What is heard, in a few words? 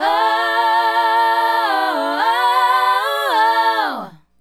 Female Group, Hip-Hop and RnB Vocals